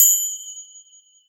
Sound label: schwing, Triangle; Misc Percussion